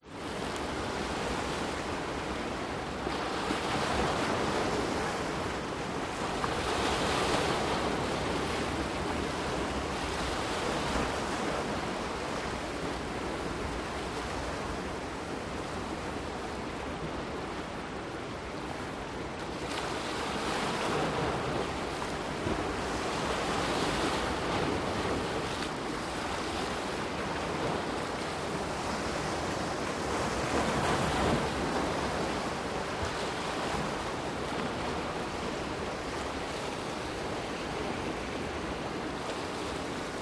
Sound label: Sea Waves Splash Rocks Wind Spain; PMSFX